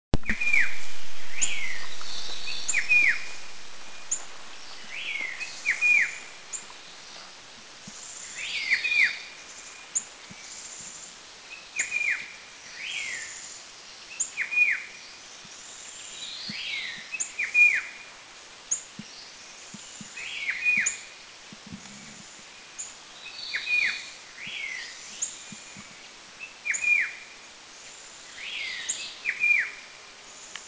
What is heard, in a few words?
Black-headed Shrike Babbler; Eaglenest; India